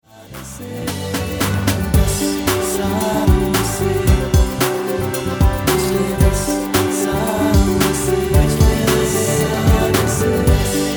ANNIVERSARY SPOUSE TUNE HINDI MALE

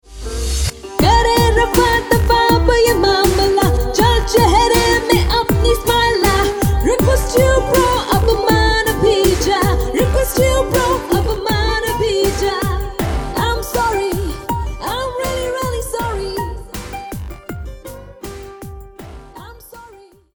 I AM SORRY HINDI SISTER TO BROTHER FEMALE

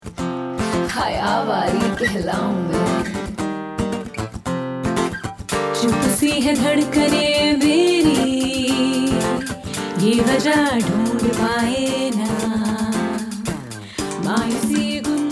Hindi female

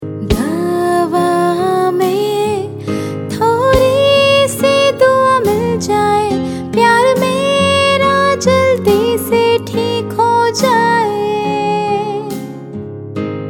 GET WELL SOON HINDI FEMALE

